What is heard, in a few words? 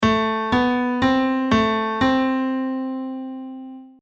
Minor Key; Quiz